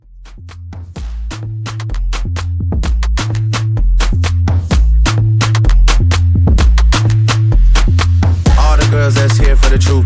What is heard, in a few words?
Club Re Drum, 128